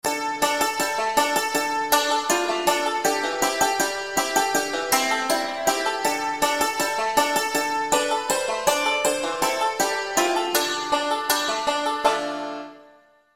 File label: drunken sailor, banjo; beginning 5 string